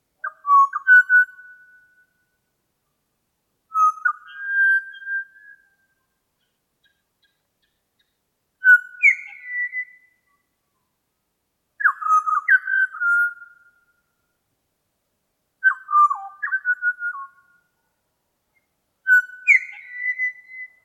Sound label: Pied butcherbird solo